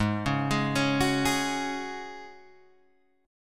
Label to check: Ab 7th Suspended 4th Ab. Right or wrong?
Right